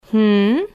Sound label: waiting hmms